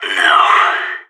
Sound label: ct death, sas; agents